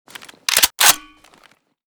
fal unjam; weapons; Mask's Reanimated Anomaly Arsenal

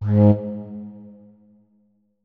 flute notes; Hideout Furniture

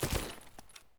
earth, human step; earth1; Soundscape Overhaul

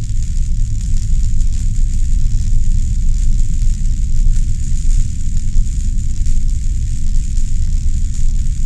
emi idle, anomaly; Soundscape Overhaul

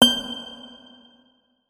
notes, guitar; Hideout Furniture

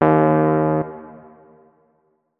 notes, keyboard, electric piano

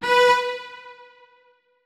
notes, keyboard; Hideout Furniture

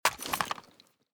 mosin draw, weapons; Mask's Reanimated Anomaly Arsenal

